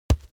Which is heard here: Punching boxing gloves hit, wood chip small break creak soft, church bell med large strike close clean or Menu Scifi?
Punching boxing gloves hit